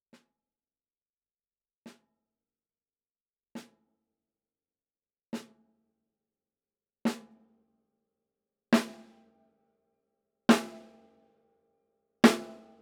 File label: Rode NT5 overhead left high